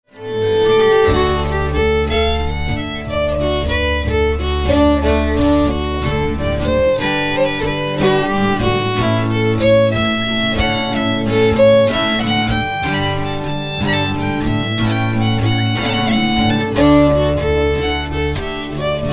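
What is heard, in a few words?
jig slower half speed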